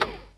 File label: tank turret rotation end